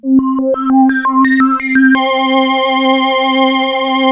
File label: PPG F4; Hollywood Edge - Giorgio Moroder Rare Synthesizer Collection; PPG.2.3